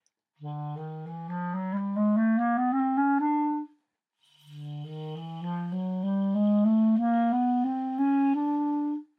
teeth stays on reed

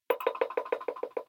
key clicks e without

